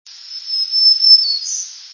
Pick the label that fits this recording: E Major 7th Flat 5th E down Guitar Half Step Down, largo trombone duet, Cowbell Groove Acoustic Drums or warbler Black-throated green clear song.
warbler Black-throated green clear song